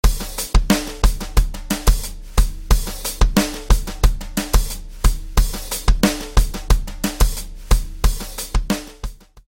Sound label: snare with Brushes; Cadeson; BFD拡張音源; fxpansion; snare selects